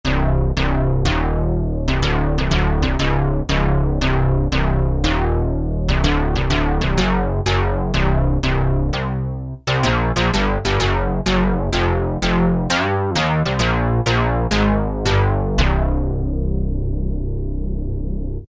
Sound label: Meta Bass, Alesis Z5; Vintage Synthesizers And Classic Beatboxes